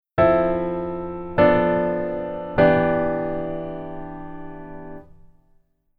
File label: Keyboard Voicing